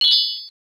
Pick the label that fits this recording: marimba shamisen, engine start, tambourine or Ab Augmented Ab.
tambourine